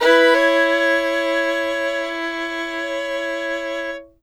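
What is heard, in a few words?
fiddle hammer A4; Sampleheads; Whole Lotta Country 1 & 2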